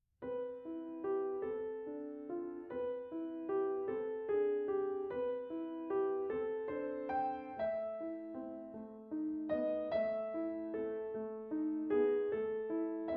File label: dizzy fairy; piano